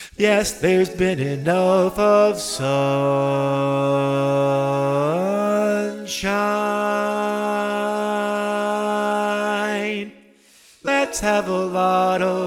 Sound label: Bass, Barbershop Tags